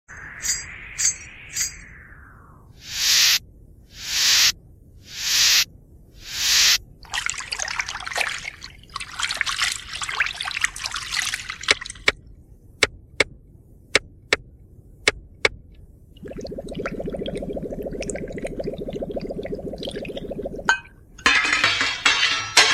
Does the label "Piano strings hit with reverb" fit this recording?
No